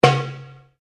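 Drum Darabuka Slap fortissimo, Egyptian Darabuka Drum; Drums; Musical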